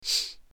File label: Sniff; Assemblee SFX